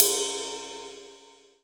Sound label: RIDE, MONO RIDE CYMBAL; AKAI S6000 CD-ROM - Volume 3